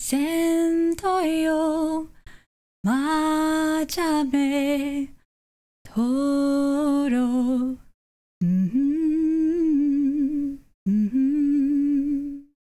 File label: Female NPC Song; Hollow Knight: Silksong